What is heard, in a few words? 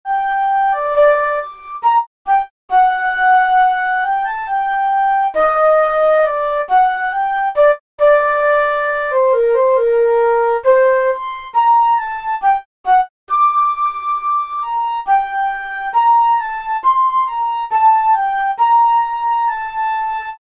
flute